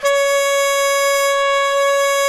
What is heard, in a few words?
mute flute, hybrid winds